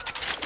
Robot idle

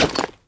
put default

